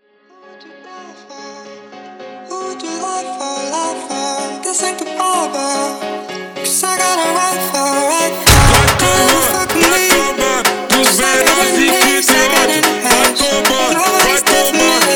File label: Funk